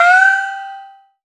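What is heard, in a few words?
chinese cymbal high; AKAI S6000 CD-ROM - Volume 5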